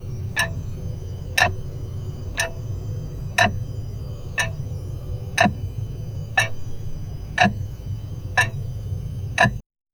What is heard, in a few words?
grandfather clock ticking